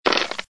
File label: goods; monster shop